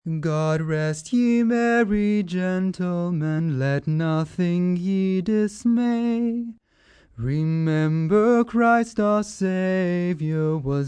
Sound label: Singing, Singing, male; VoiceBox